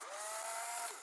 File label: Camera Print; Viewfinder